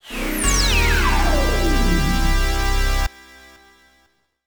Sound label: LOSE